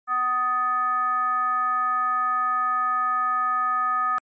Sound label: FM 1000 sin 10